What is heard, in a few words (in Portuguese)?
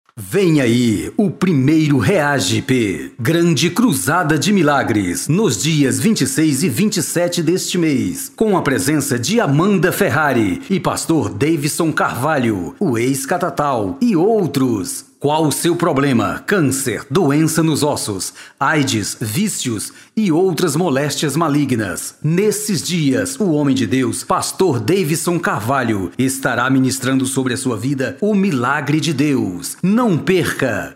vinheta; adoci